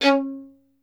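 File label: STR violin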